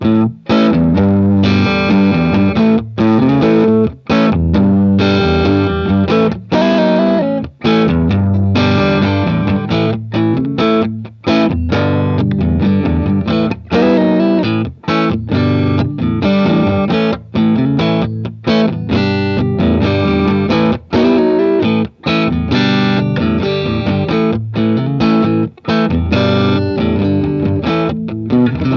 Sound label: 335 neck coil split